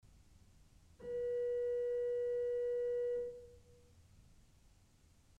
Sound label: Principal 4' h, orgel pipes